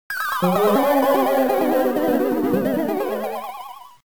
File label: BOMB FALL; WarioWare Inc; BOSS Goma